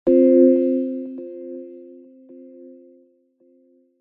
multimedia game sound childrens soft warm single chime generic